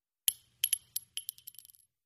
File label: Shell Case Sngle Tube, shell casing movement